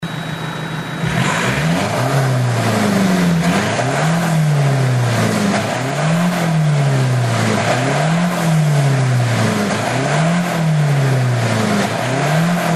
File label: Mazda CX3 DK SkyActiv Muffler Audio; AutoExe